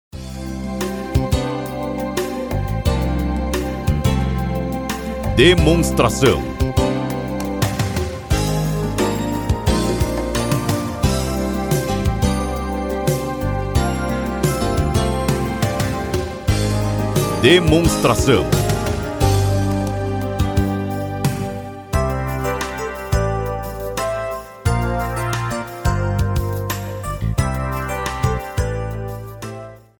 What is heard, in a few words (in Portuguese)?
Trilha